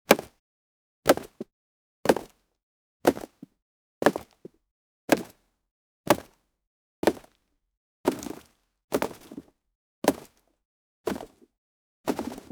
multiple bow shoots one b

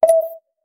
icon click with ui effect; interface

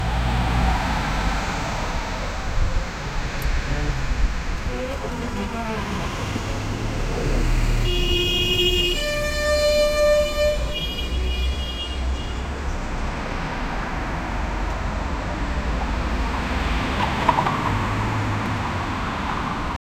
pedestrian street sound; city

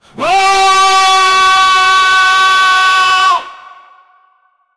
cartoon style, human vocal

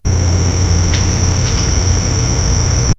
the soft sound of a, bear

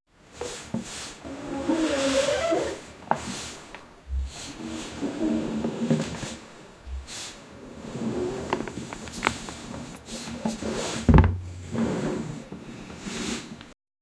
the sound of a mans, interior

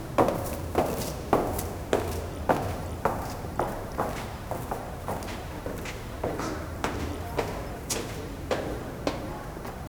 the priest walks through, temple

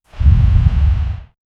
a wood and fire swoosh, burning

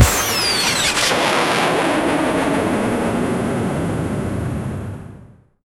spacemarine lascannon cha, charging

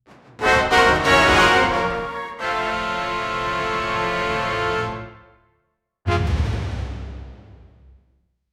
explosive mega win lou, brass

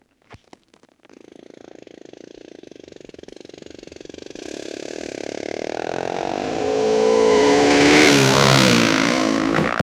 realistic dirt bike off road, offroad

